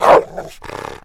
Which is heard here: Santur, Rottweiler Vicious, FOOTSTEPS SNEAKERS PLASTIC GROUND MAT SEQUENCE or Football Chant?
Rottweiler Vicious